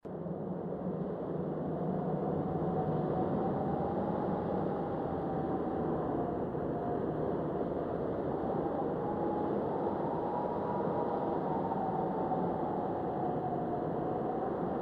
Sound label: dungeon big wind